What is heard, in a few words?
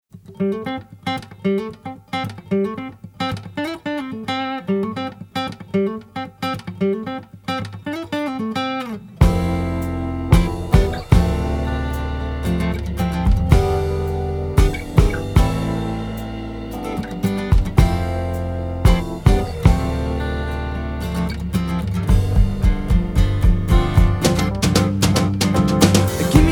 Acousitc